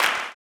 CLAP, blues & soul; ILIO; Vocal Planet

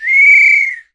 bird calls, percussion

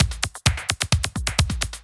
drum 130, techno trance drumloops

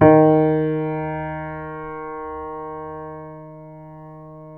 MF D#3, GRAND PNO

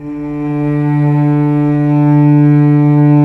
cello C2; AKAI S-Series CD-ROM Sound Library VOL-2

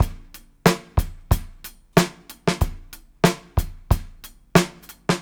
Live Beats, R&B Drums

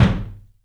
ROOM KICK; 3D Drums

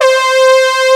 SYNTH BRASS; SYNBRASS1; East West; Global Sounds

